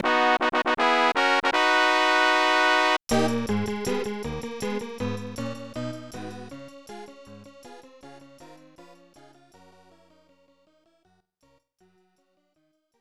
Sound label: Casino Win, snes; Estpolis Denki 2; Lufia 2 Rise of the Sinistrals